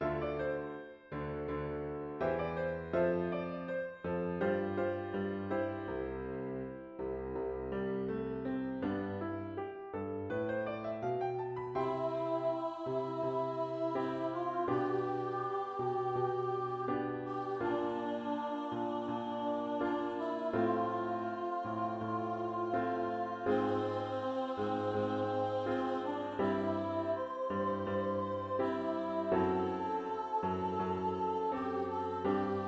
piano

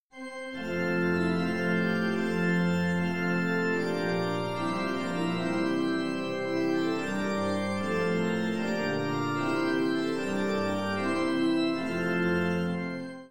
Brudmarsch

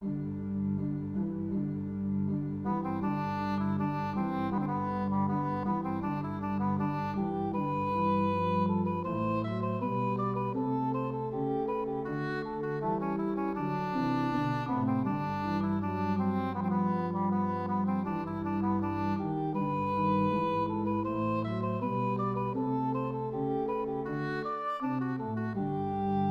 Gånglåt från Mockfjärd

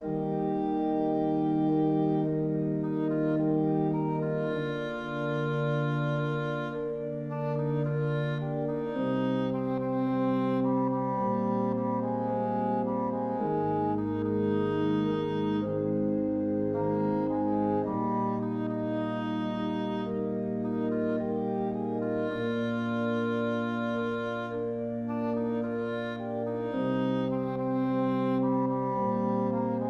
Tro orgel in Ess

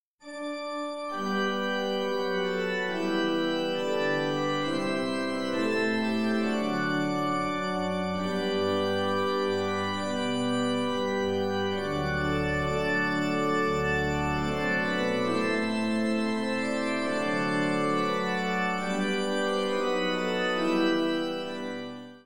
Karleksvisa Jamtland

